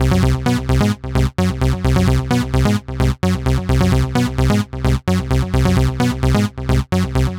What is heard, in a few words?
NRG Bass, Trance